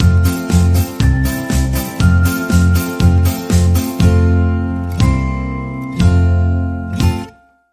Drifting Higher, Sting Upbeat

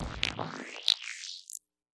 Thin Static Transition

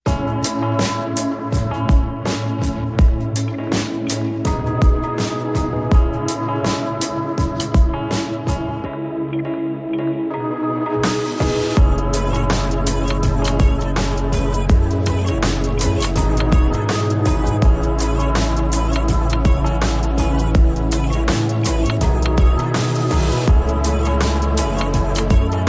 Daughter Drums

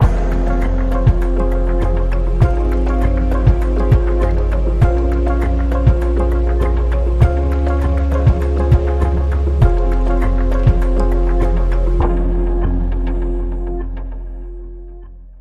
Bumper

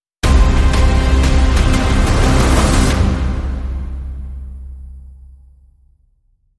Short Sting No Choir

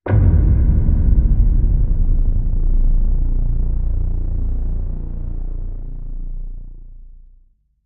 Hit Low Vibrate

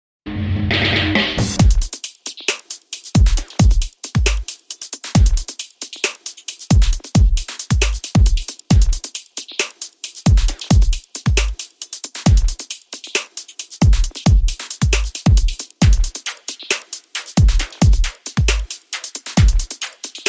Beat

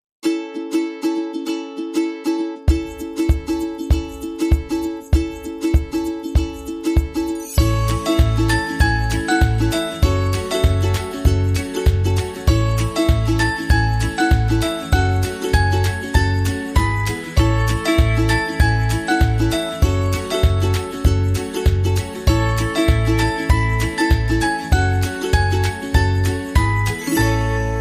Modern Drums

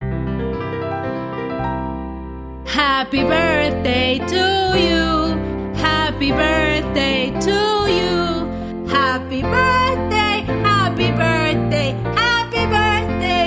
Female Off Key Happy Birthday

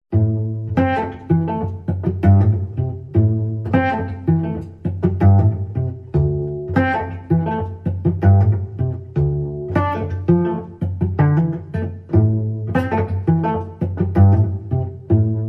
Cello Suite 2 Variation 3

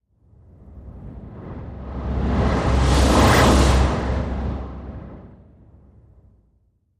Turbulent Sweep

